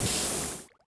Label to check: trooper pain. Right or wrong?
Wrong